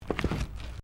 Footsteps Stairs Wooden Carpeted Step; Crocs; Footstep Foley; Human

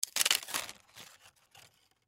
Plastic Small, Plastic Small Package Open Rattle; Materials; Foley; Plastic Sound Effects

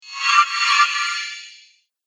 Magic Shimmer Spell; fiction sound effects

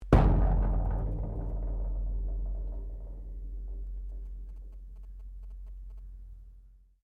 METAL IMPACT RATTLES; Sound Effects:Materials

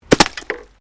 GLASS SHARD SMASH

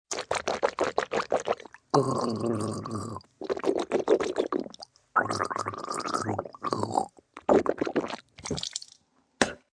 Swishing, gargling, spitting (human voice); Swishing Gargle; VoiceBox

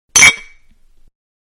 Beer Bottle Clinking; Drink Mixing (Sound Effects:Bars); Manhattan Production Music

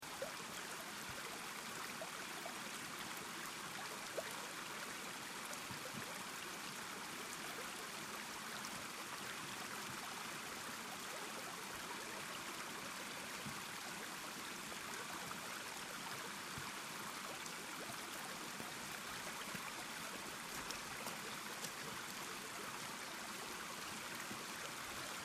River Stream Rushing Water Loop Short; Medium size water stream, river, creek, close to water with medium water rus; River Sound Effects

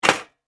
Kitchen Toaster Pops; Kitchen Sound Effects

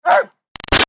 Dog German Shepherd Bark Single; Animals; Dogs

